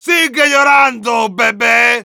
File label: yell, es; Heavy